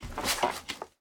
Office-Chair Sit; Sittable

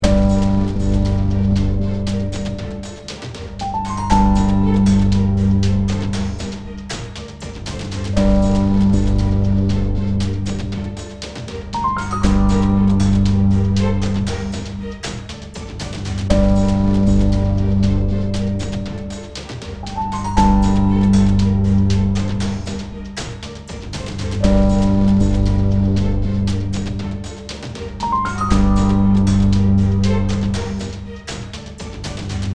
CG loop

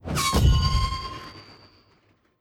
Enchanter Magic

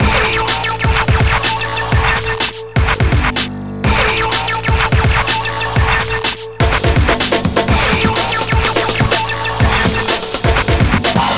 Music Loading

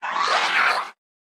Death

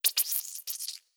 Sponge Hit

